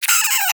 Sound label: Vocal Pain, Critters; Characters; Warframe; Cryptilex; Hunting Critters